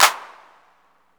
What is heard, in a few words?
handclap, tr808